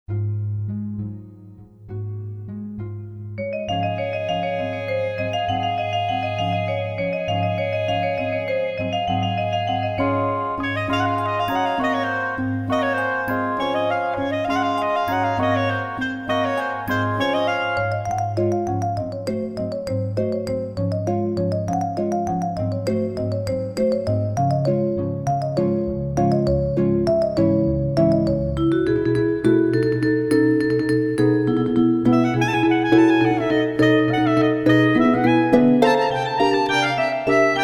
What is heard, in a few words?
Capriccio Espagnol, Fandango; Baby Einstein